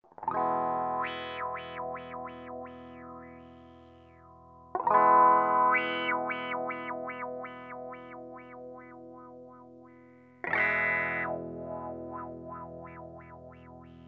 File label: Wah, Trebler